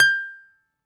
BALALAIKA, Prima Balalaika, Plucked Strings

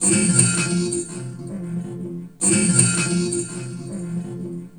Strained Guitar, Strings; Sonic Foundry (Sony Creative Software); Myths of Technology

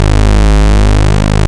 101 PWM; INIS - Vintage

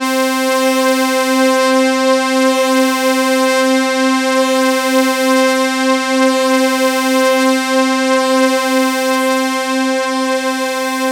C4, SynthLine; 90 s Sample CDs; NiceBeats; SynthLine VOL-1

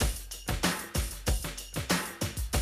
Classic DrumLoops

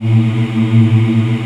VOX AFMM, VOX Afro Mms, Africa

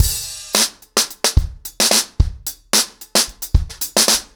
110BPM, acoustic drums, drumloops

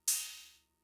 Cymbal; Fricke MFB-522

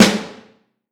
Vintage Snare Drum; 5.5X14; Q Up Arts; Johnny.C's Vintage Snare Drum

